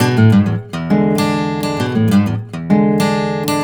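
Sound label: baritone riff, Blues Guitar; ILIO; Fretworks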